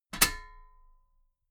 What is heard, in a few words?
metal ladder step heavy